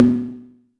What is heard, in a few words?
Wood; EFM